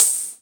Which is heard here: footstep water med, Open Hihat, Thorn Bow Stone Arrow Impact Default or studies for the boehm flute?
Open Hihat